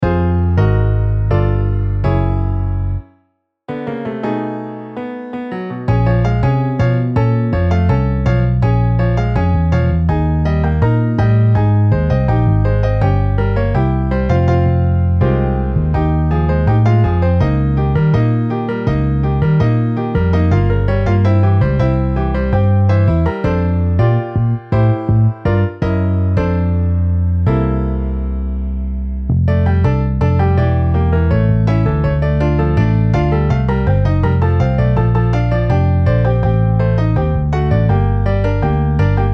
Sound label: Piano Bass